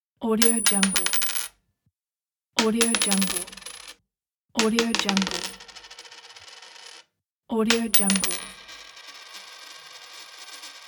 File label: falling coin